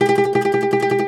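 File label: OUD tremolo, Plucked Strings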